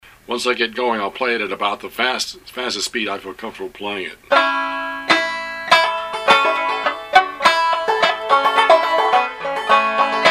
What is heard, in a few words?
cripple creek A, fiddle